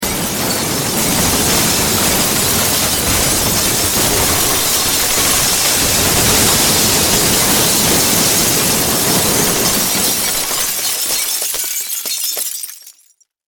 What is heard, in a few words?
Impact Crash Wood Glass Long; Materials